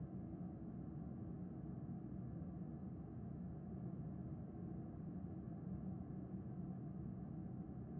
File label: Ambience Halls